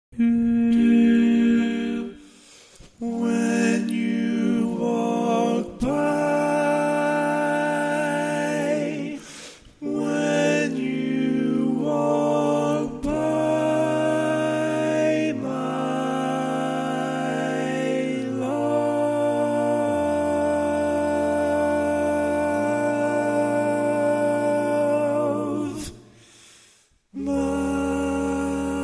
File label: Lead